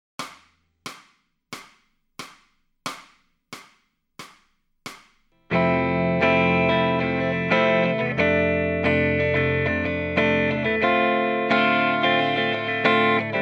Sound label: Lead Backing Track, electric guitar; Grade 5